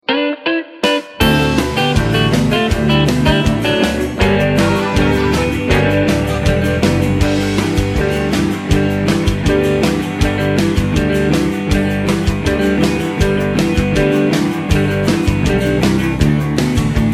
G No Backing Vocals, backing tracks

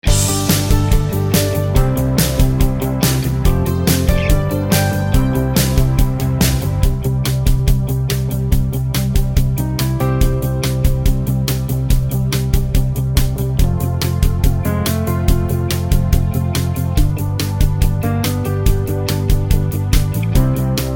(C To Db) No Backing Vocals

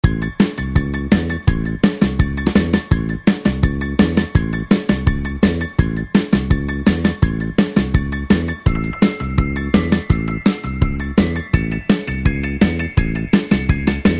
A No Backing Vocals, backing tracks

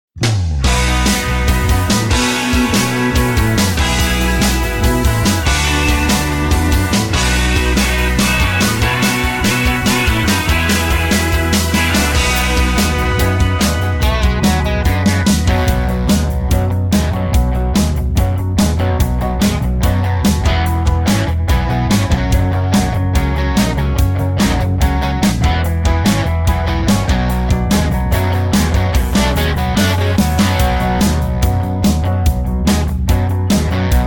(B) No Backing Vocals

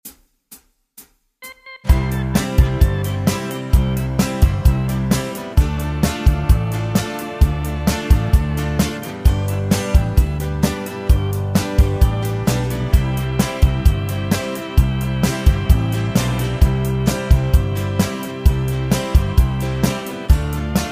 C To Db No Lead Guitar, backing tracks

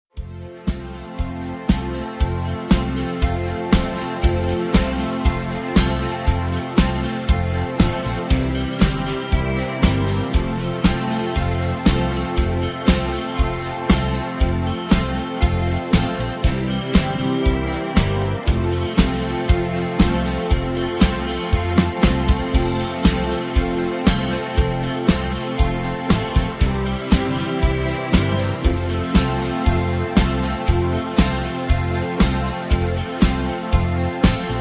(F) No Backing Vocals

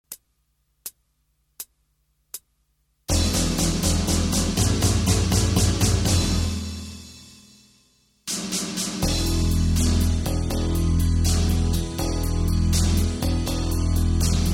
E No Lead Guitar, backing tracks

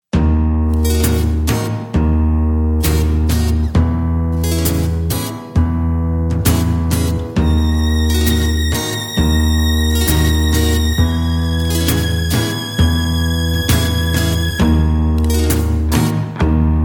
B No Backing Vocals, backing tracks

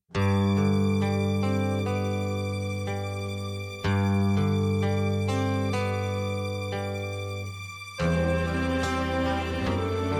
Bb To C No Backing Vocals, backing tracks

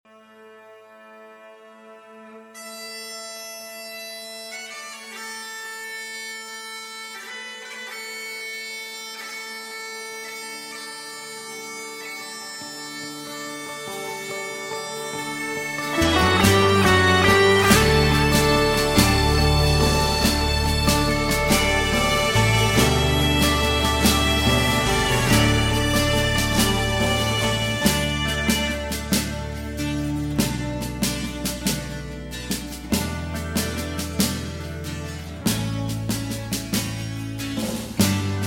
No Backing Vocals, (D)

